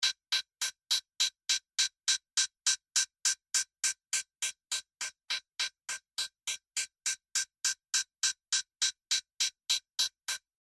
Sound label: HiHat